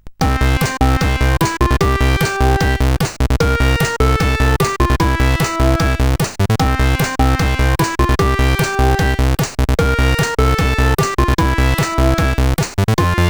CSG8580

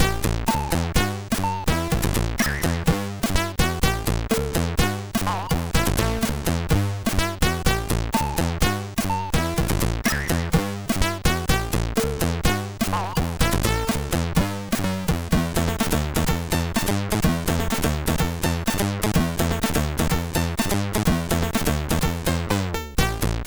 sid MOS6581R3

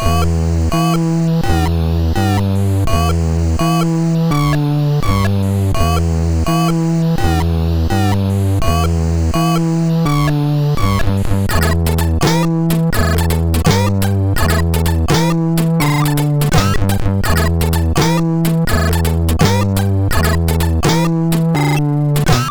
MOS6581

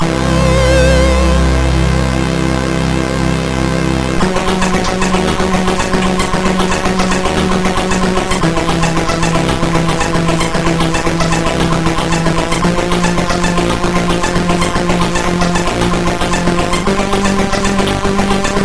E-Load loader, MOS6581R4; hvsc

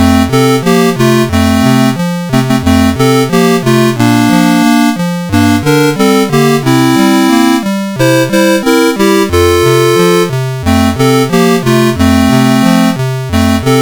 Jingle Bells, CSG8580R5

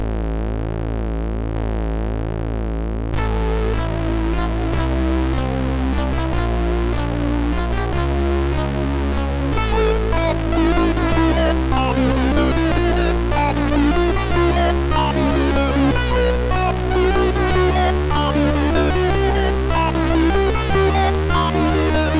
Slow Rock, sid MOS6581R3

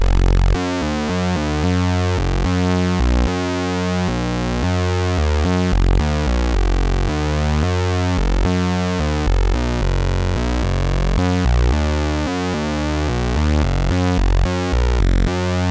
Alien Attack 64 BASIC, MOS6581R3

